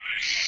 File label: slide; PaxImp